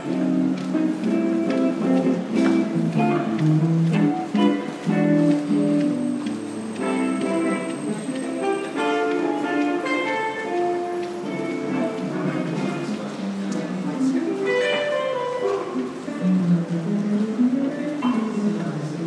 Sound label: restaurant musak busker